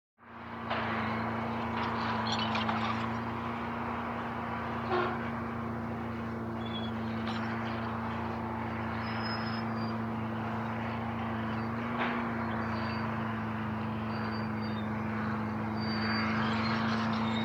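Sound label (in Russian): подъемный кран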